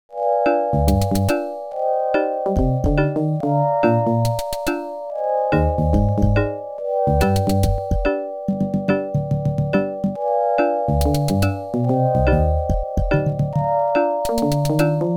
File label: OPL2; the secret of monkey island